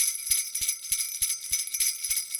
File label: sleighbells 100, latin, 100bpm; Sound Effect Library